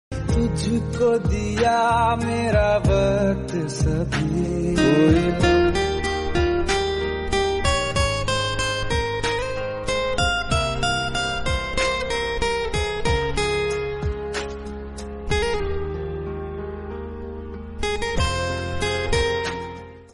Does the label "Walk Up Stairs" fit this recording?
No